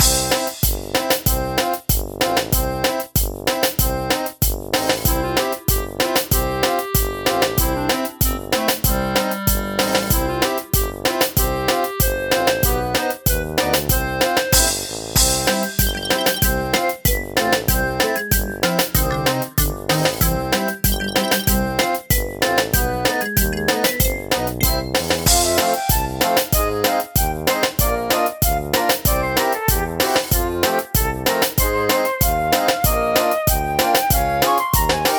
Cartoon Game Theme Loop

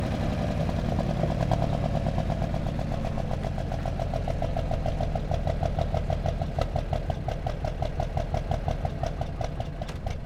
Car Start Stop

